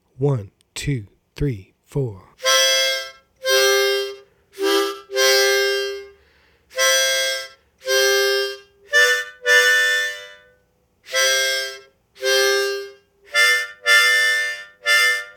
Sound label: Slow; Good Night Ladies